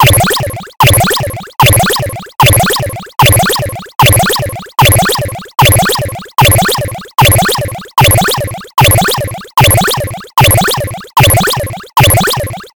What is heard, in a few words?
cartoon machine pulsating